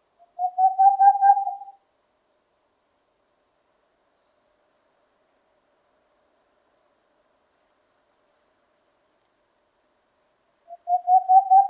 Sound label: Aegolius funereus; Ruigpootuil; uilen; Strigidae